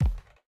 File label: Step Sub Wood; MiSide